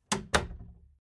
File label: Household Door Small Door Closed Light Latch Sound; Latch Handle; Miscellaneous Door